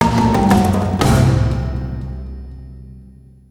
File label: Africa Fail Ditty; madagascar escape 2 africa; xbox 360; ps3; wii; ps2